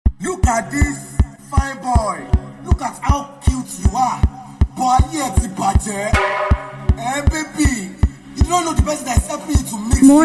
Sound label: Beat